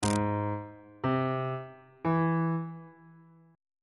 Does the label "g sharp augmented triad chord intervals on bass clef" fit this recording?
Yes